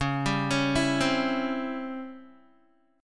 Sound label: Db Minor Major 7th Flat 5th Db down Guitar; 4E,4A,5D,5G,5C,6F; arpeggiated